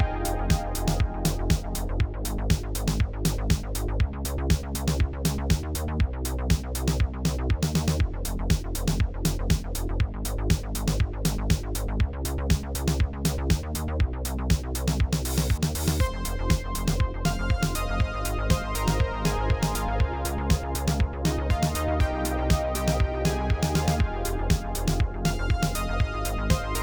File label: bgm